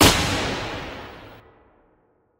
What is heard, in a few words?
gunshot; sonicova